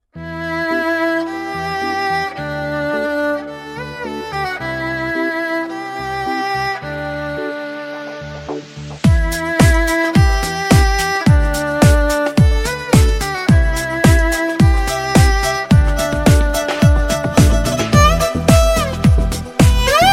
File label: Violin Theme